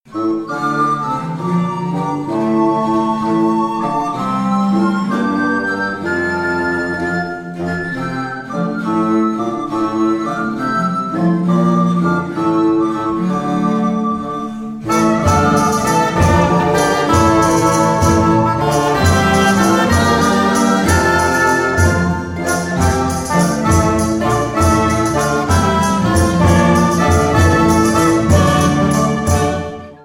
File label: Intrada